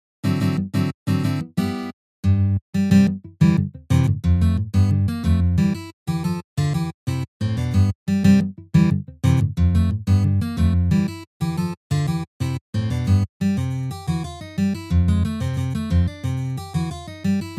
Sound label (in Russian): Mario na gitare slozhnyj variant